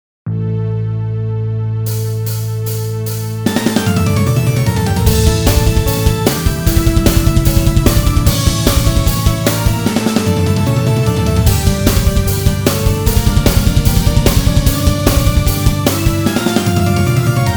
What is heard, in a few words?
tralla backing